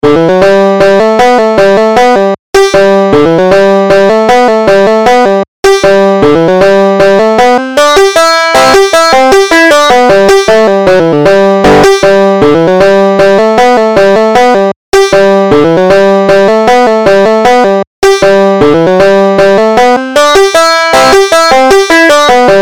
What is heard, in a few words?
big scioty, banjo